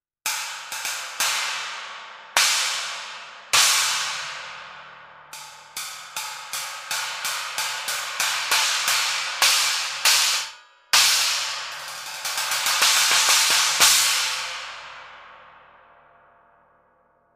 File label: Voodoo 20 Inch China